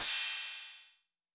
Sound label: Cym B 808 Sat Decay A, Cymbal, Multi Saturated